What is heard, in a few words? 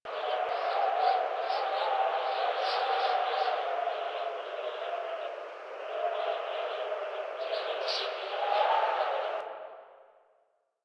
Interior Wind Howl Bed Loop